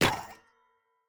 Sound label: spawn, copper golem; Minecraft